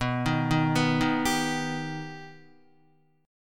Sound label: B Augmented B Guitar, chord; 6,6,3,4,3,3; arpeggiated; JGuitar